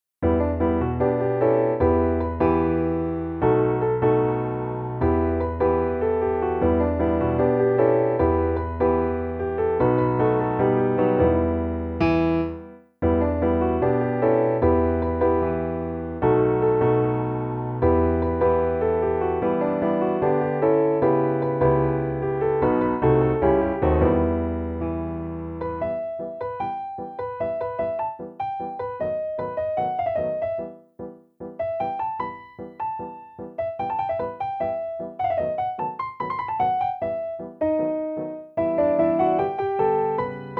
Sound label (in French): Piano seul; Jazz